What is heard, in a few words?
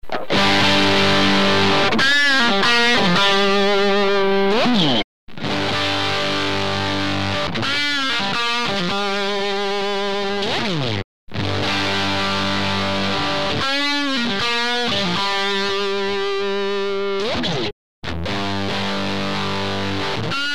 Multi-Face, guitar, effect at full gain; Bulldog Cab Sim - sound card